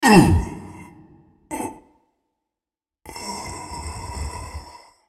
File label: dying; Nasus; Character Voices - English